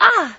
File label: fem hit